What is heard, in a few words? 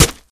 Hit Stab